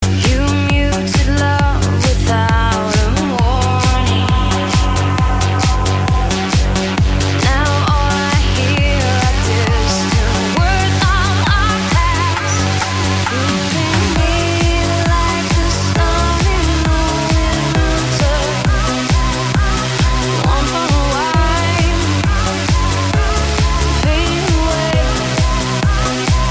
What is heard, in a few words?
cool vocal and beat